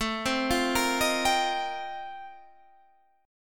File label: F 11th A, chord